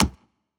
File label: UI switch; The Avali's Audio Overhaul